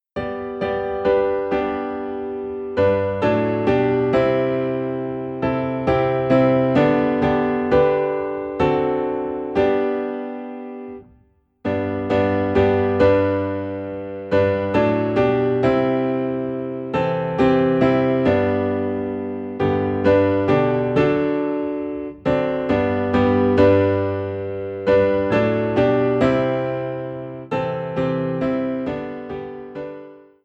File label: Piano in G; Higher Ground